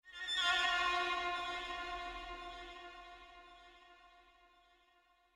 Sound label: Jingles VINES OPEN; Semblance